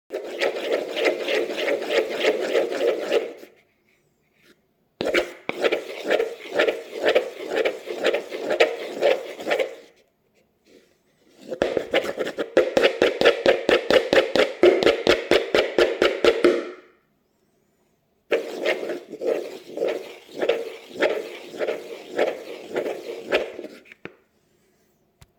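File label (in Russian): смешивание ингредиентов в пластиковой миске деревянной ложкой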